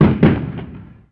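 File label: gun us assault medium; ModernWarfare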